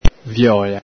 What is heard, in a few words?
viola